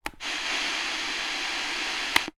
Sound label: technology radio on receive static off short